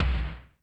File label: More Toms, Drum Machines